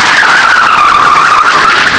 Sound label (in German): Reifen; gp500